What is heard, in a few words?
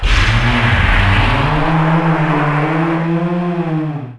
scp ambient; ghouls forest